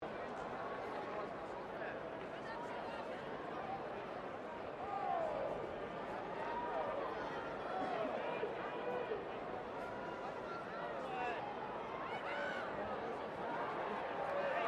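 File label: crowd 15K Rugby World Cup distant loop, Rugby world cup game; Crowds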